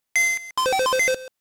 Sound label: computer open; Pokemon Essentials; Pokemon Opalo